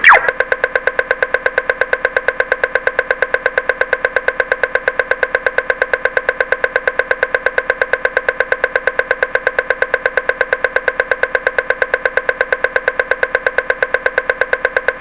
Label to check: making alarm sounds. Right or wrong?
Wrong